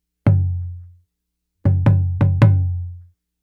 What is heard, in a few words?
Tambora, African; Sonic Foundry (Sony Creative Software); Continental Drift Aftershocks